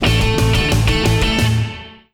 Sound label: Alien Transformation; Ben 10; switch gamerip; Heatblast